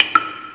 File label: Waterdrop; Knight Online